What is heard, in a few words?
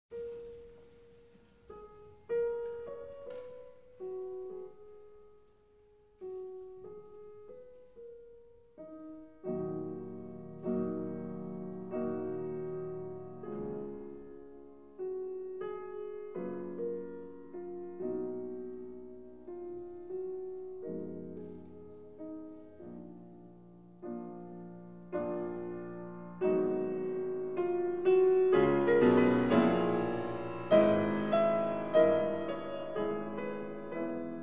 Preludes in E-Flat Minor